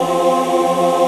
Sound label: VOICE PAD; Best Service; XX Large; Pads